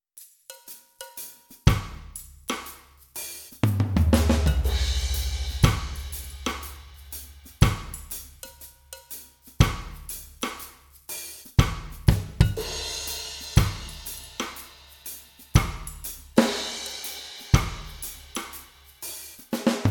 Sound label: reggae; rayzoontech